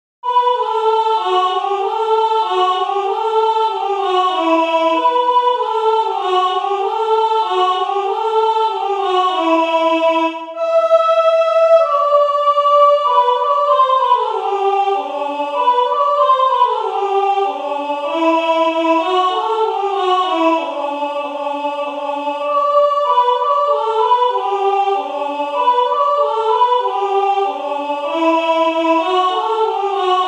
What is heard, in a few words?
Kalinka soprano, chorale